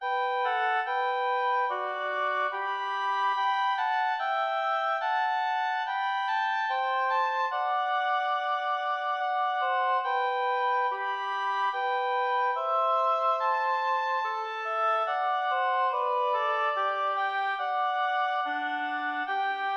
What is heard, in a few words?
sarabande; romain